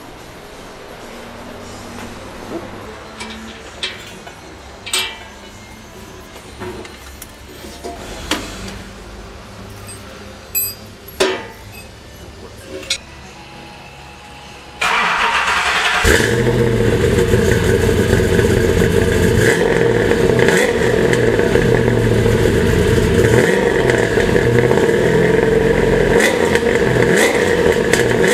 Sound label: muffler; iroc